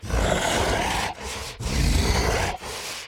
Walk Cycle Burns; The Town

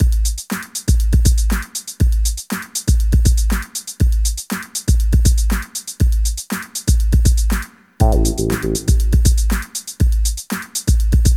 BoomBox Beat loop hi; City of Villains soundtrack

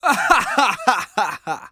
Emote Male Evil Laugh; Idle Champions of the Forgotten Realms

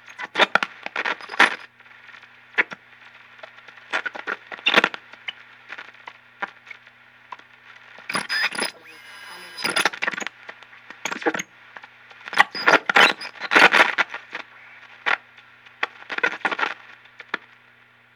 Safe Zone Radio Static

